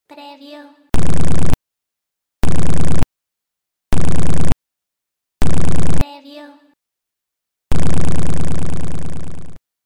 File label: Ringtone SMS